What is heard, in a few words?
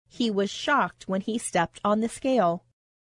scale